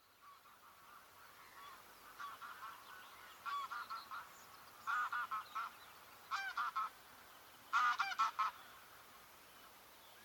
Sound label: Anser anser; غاز وحشی خاکستری